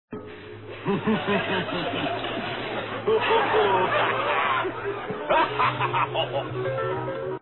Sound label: laughter